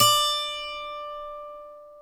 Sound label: D4 12-ST AC, AC.12-STRING; InVision Interactive; Lightware VOL-1; Instruments & Percussions